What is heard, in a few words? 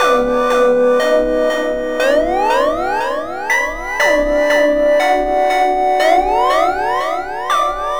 Synth Pattern; Electrocution